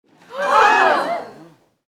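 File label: small crowd in shock; Asian Show Sound Effects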